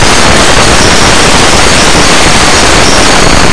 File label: gear